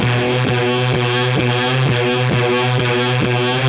Hondo Fuzz 130 B, guitar drone

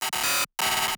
Glitch

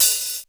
OPHH, DRUMS; Crypton - DrumBase5000; T1 DRUMS

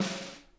snare, drum kits

